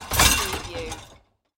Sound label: Dishwasher Rack Pull